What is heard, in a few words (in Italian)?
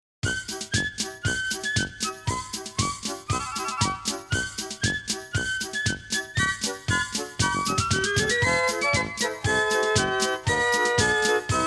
Violini